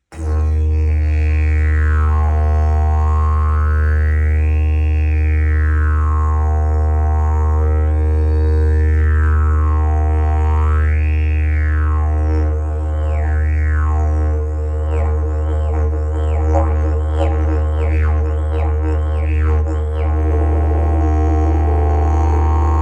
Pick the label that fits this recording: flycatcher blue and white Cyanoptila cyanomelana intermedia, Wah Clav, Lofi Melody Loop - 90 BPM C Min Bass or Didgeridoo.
Didgeridoo